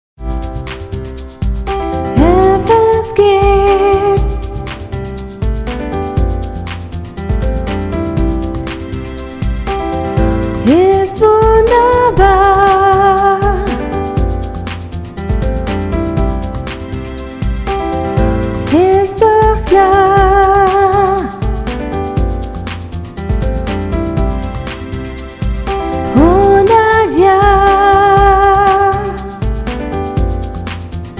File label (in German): Jingle Projekt; steinhundert